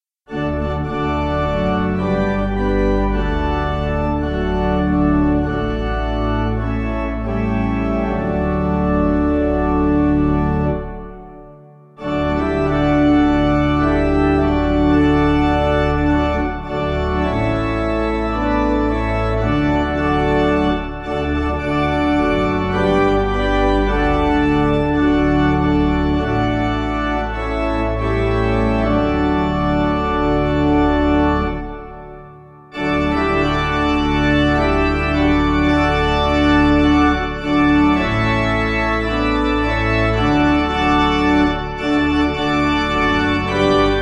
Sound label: Pipe